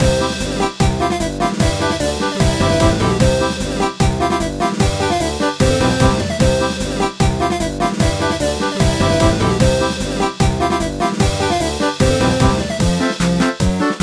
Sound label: Minigame; Cookie Run OvenBreak; Circus Minigame 01